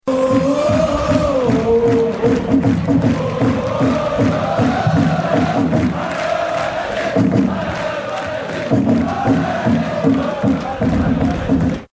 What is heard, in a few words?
om chant